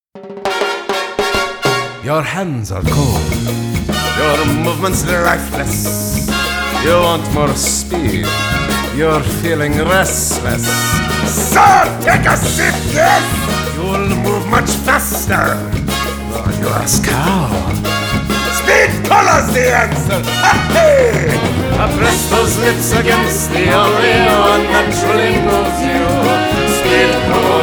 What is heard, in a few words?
Speed Cola Jingle; Shadows; Call of Duty Zombies